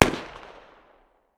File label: smg 45 medium distance; tacz-tweak-pack; taczmechanics